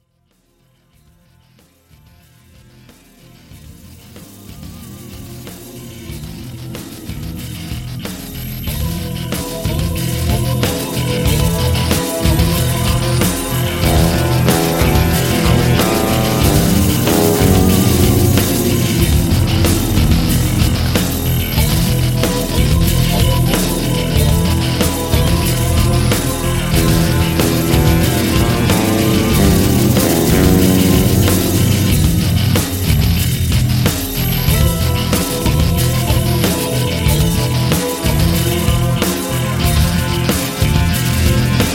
Instrumental

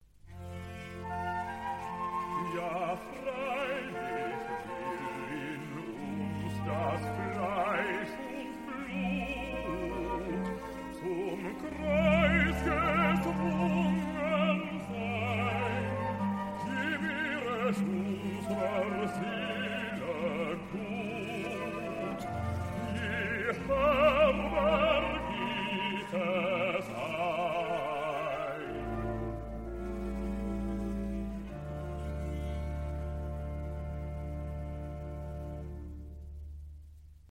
Recitative (Bass); St. Matthew Passion; Matthäus-Passion BWV 244

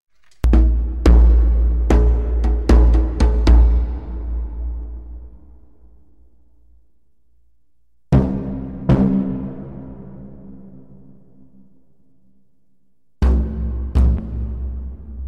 Percus; Troy

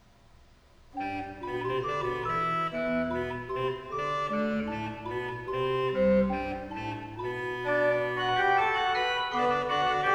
Fugue, Organ; Magnificat octavi toni; Johann Pachelbel; Complete Organ Works

